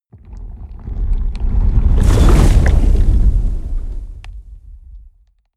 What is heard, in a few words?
whoosh fire lava wave large